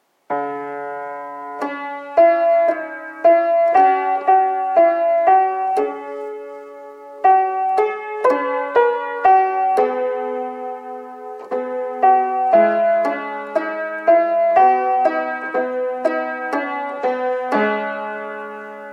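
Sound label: Communion Antiphon; 4th SUN Advent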